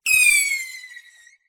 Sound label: Firework Scream